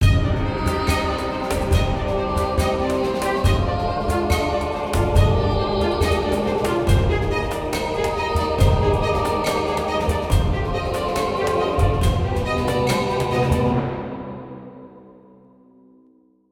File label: Battle Drums